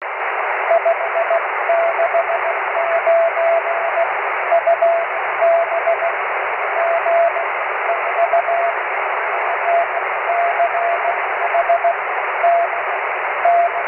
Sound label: cw, 23cm